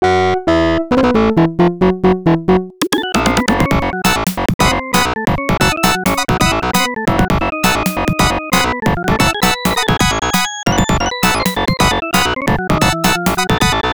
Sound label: Bonus Stage; Breaking Bricks; Street Fighter; arcade